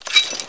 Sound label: rpg holster